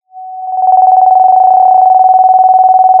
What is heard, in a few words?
Sound of a phone ringing